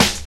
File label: SNR GROOVE, Drums; AMG; Now CD-ROM (Roland)